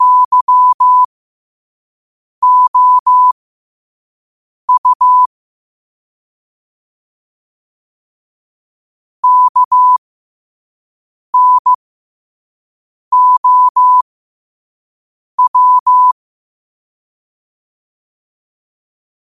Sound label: 5 wpm, morse